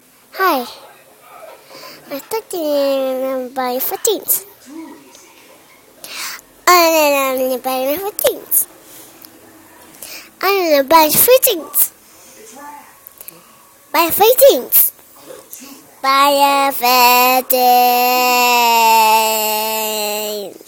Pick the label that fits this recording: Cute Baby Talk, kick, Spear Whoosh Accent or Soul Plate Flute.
Cute Baby Talk